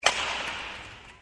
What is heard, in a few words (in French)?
frappe moyenne